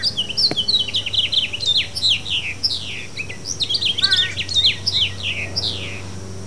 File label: house finch, Hawaii